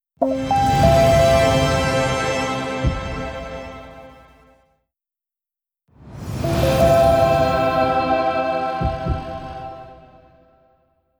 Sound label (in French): JINGLE CARROUSEL IN et OUT